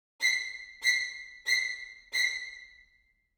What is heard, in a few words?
Psycho String Stabs; soundscrate